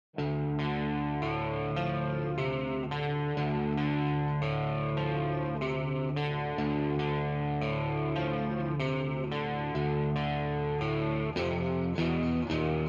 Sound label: Drop-D, Riffs